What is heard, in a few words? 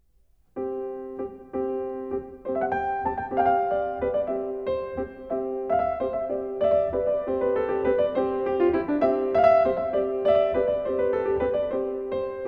Folk Dances Norwegian Dance; Naim Audio Sampler; Grieg